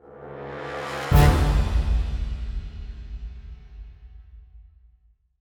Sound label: Cresendo Hit; rambo the video game 2014